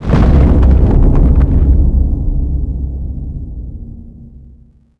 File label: foot far